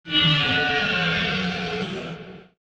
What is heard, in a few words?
Roar & Scream, Vocals Monster